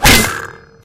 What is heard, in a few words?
sawblade stick, metal; Source Engine; video game; physics